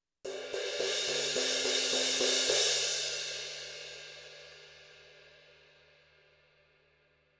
18 CRASH BUILD, cymbals

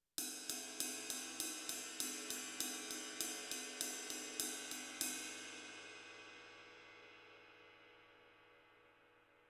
RIDE ROCK

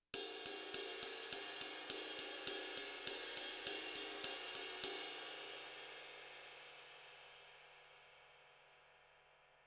20 CRASHRIDE RIDE, cymbals

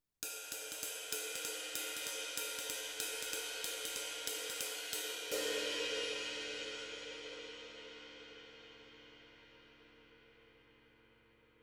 21 RIDE JAZZ, cymbals

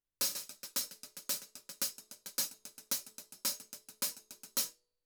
14 HH CLOSED, cymbals

